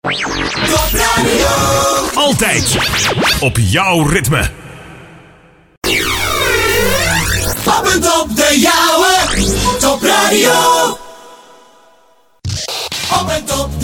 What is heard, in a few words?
jingles; Topradio